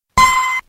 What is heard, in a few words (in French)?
bip